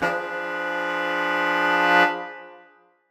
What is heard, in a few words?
Horn Swell Fdim; undercover samples